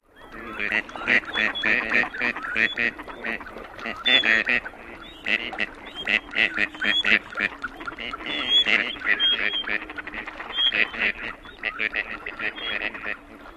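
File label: courting group, bird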